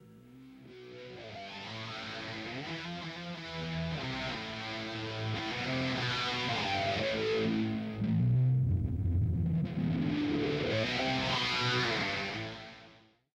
mus recall; Smite

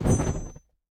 dagger flame; smite; pele

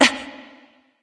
surprise; smite; voicepack; skadi

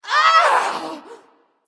ouch; smite; serqet

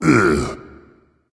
ugh dragon; fafnir

